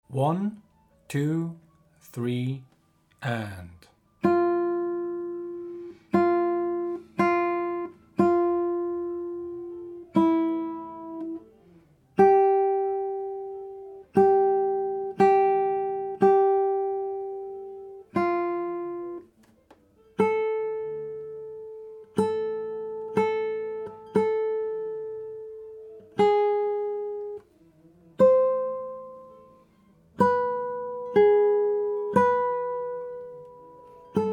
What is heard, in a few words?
60bpm, guitar